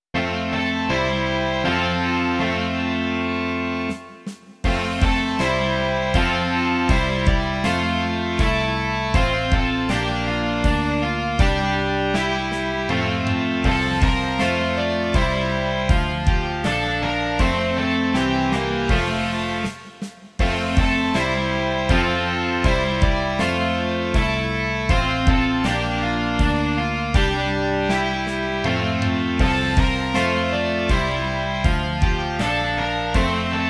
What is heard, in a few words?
rock